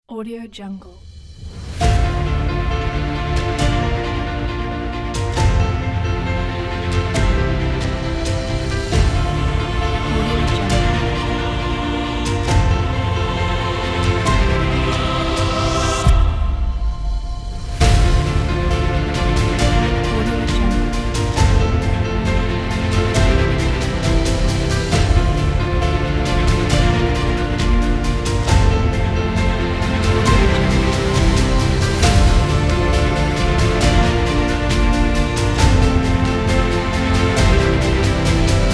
epic trailer emotional; epicmann

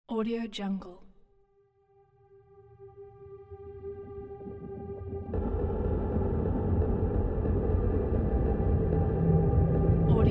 haunted corridor horror ambience; diogo-pereira

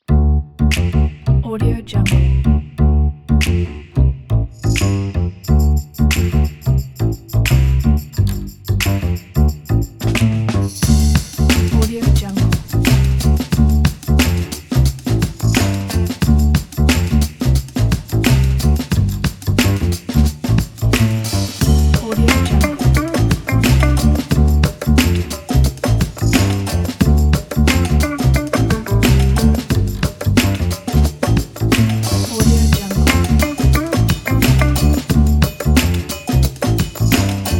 upbeat funk groove

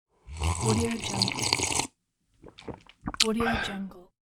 drink slurp swallow sigh

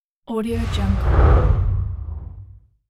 short deep wind whoosh

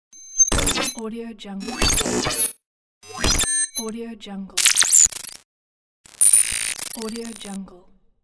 glitch short

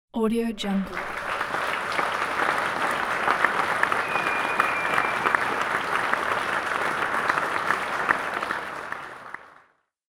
mid audience slow clapping

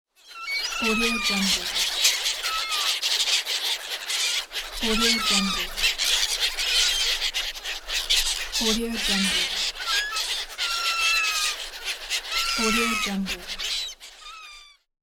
seagulls calling

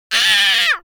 human vocal little girl cry aah

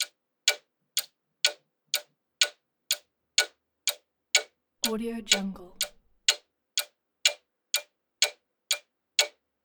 clock ticking

